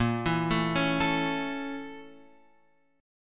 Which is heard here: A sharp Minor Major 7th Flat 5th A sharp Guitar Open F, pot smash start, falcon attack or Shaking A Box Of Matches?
A sharp Minor Major 7th Flat 5th A sharp Guitar Open F